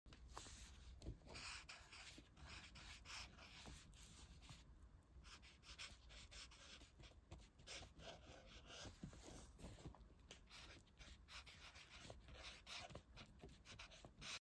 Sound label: marker on paper